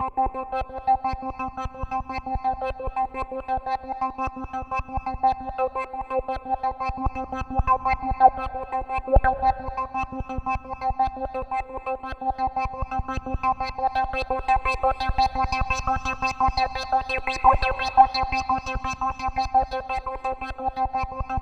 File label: C3, CLUB ORGAN; Roland JV 2080